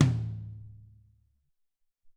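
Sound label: Ambient Tom Low, Alesis SR-18 Drum Machines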